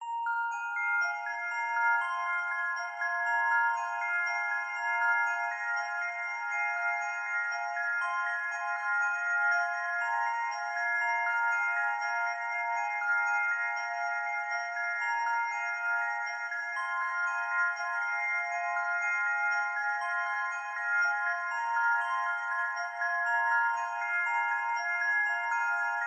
sound design soundscape twinkling windchime designed musical relaxed chilled; zapsplat